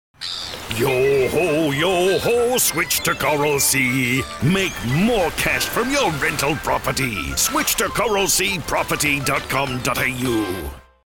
Singing

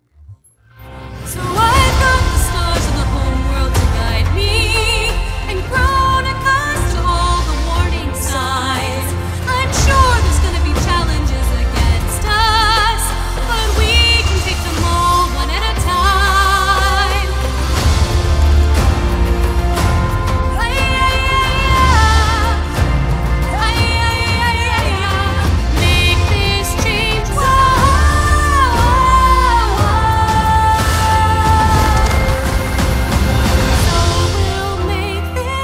Singing

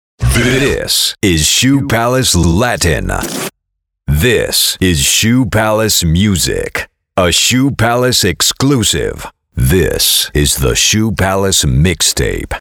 Jingles; Shoe Palace

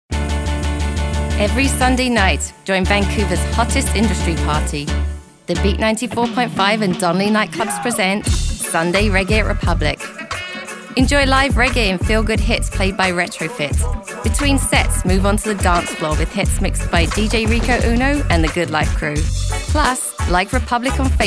Sunday Reggae

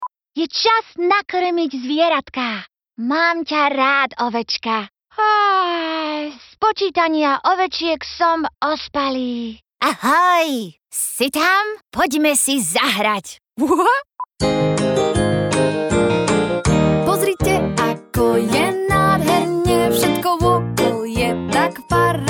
Toy Characters Singing

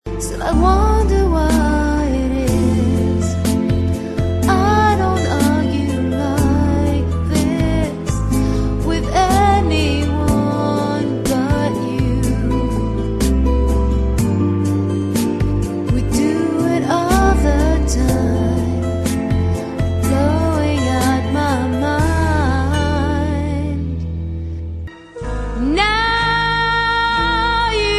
english pot pourri singing

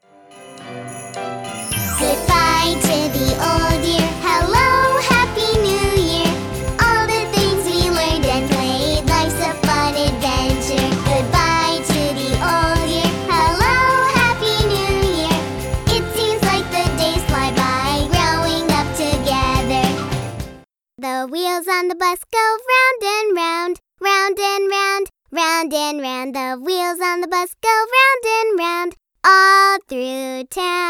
Singing Samples

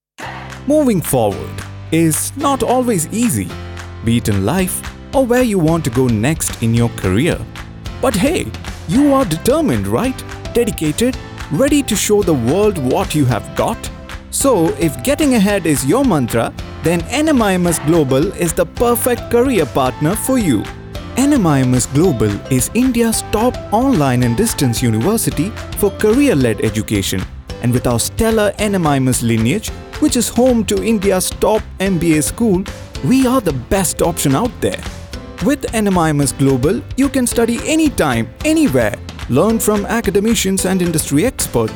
music

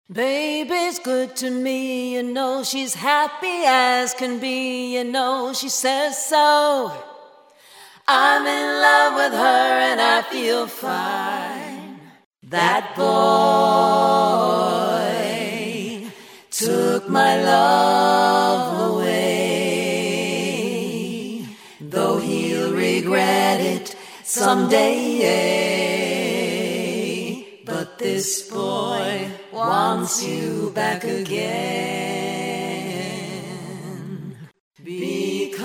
Acapella

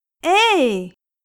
Hit by an enemy Grunts

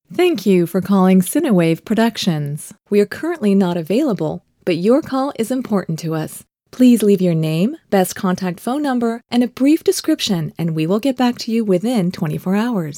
phone sinewave

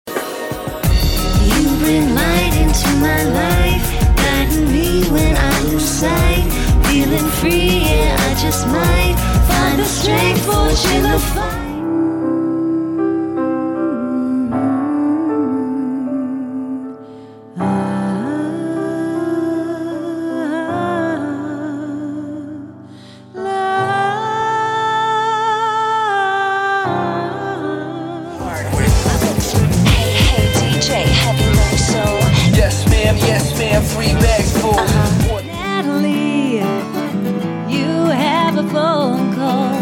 Singing

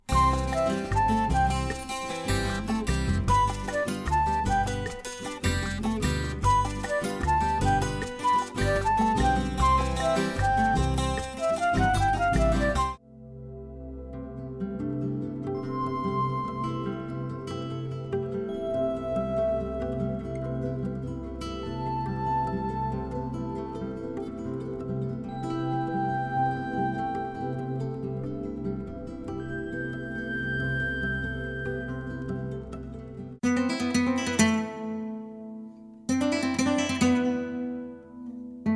Music

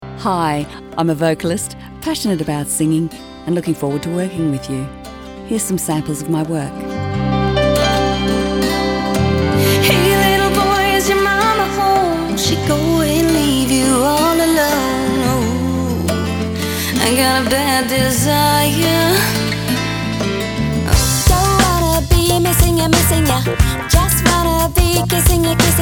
Singing

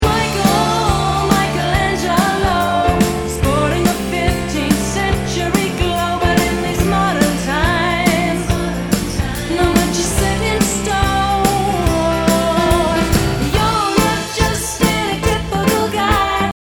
Upbeat Singing

